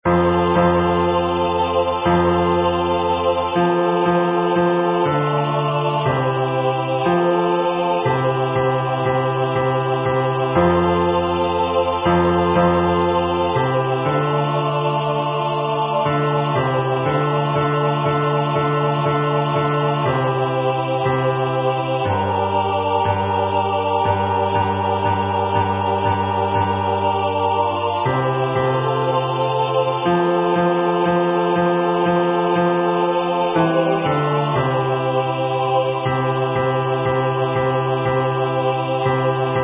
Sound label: Bass; Our Father